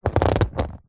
household chair sofa couch leather body movement arm leather creak; zapsplat